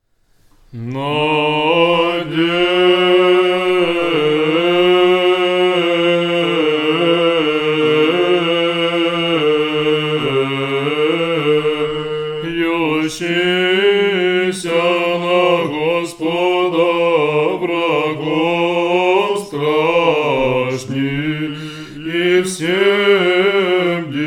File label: third antiphon tone 3